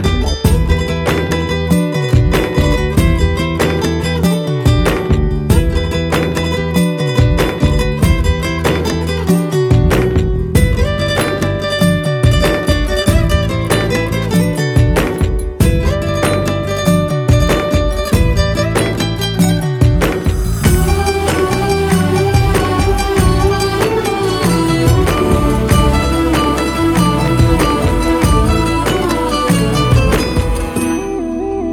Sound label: Instrumental Tone; Puli; Tamil